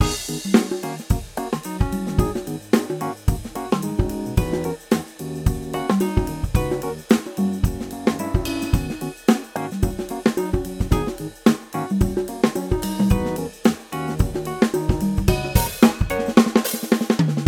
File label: main music; RS Games Sounds; Toss Up